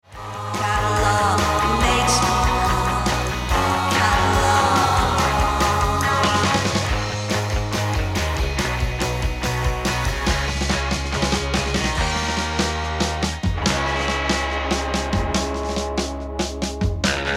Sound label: Surf Bass